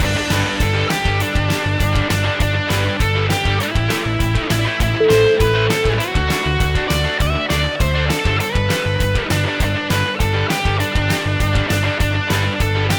rocky jingle bells

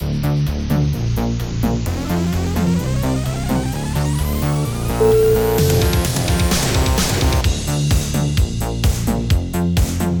neon attack 80s

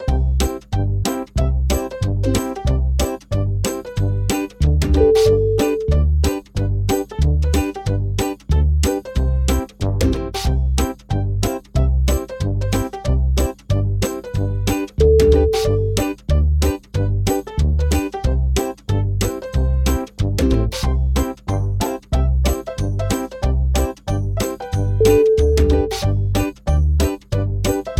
funny honky tonk

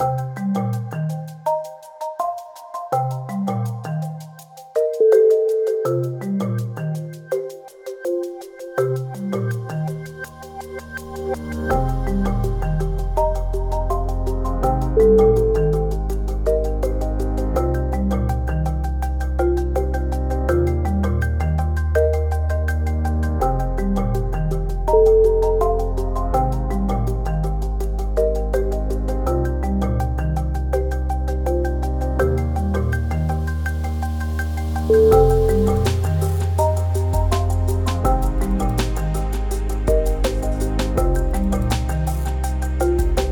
thoughtful marimba

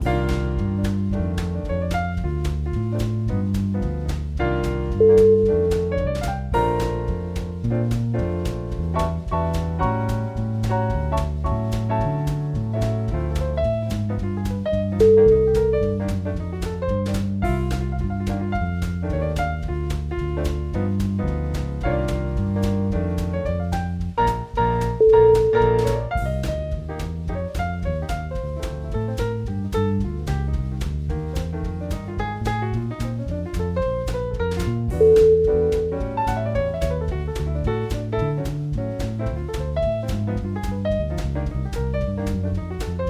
super swinging jazz trio

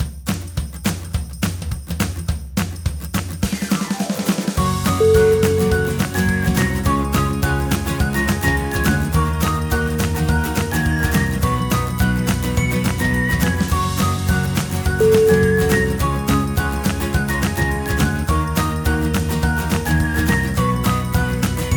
upbeat energy

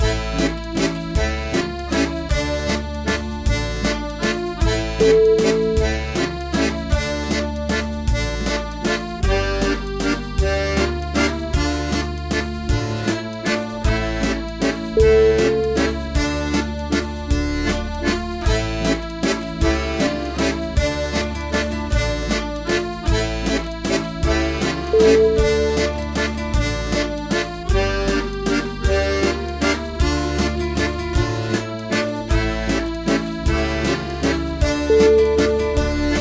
russian waltz

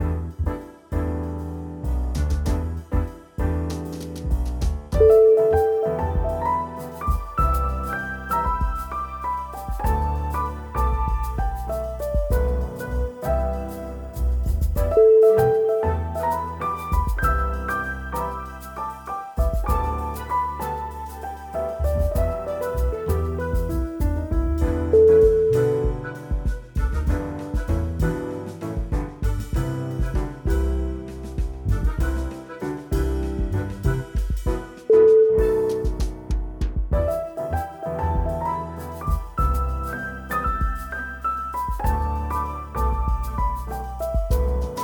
jazz deluxe

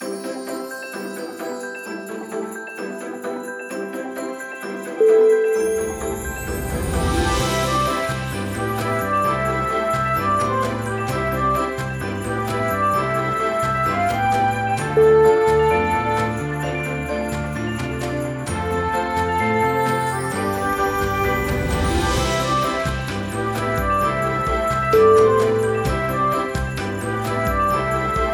ice waltz

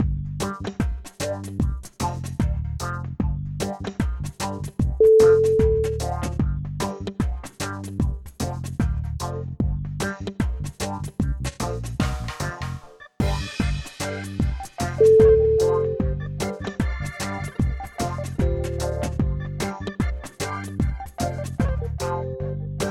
reggae vibes

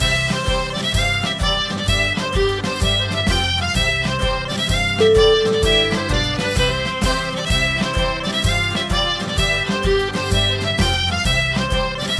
scottish jig

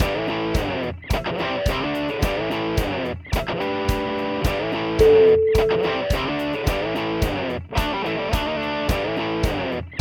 tough southern rock

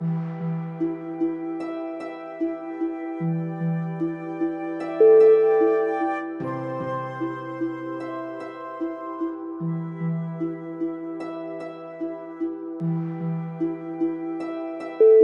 ambient flight

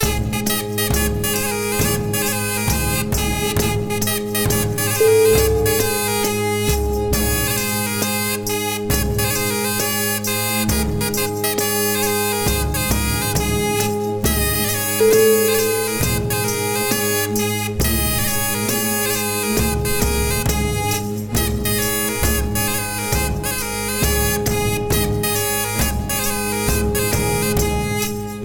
real sounding medieval dance

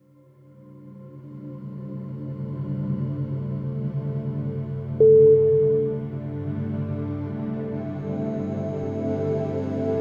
zen meditation

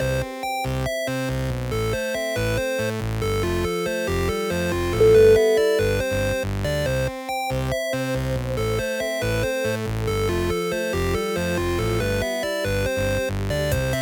indie 8 bit

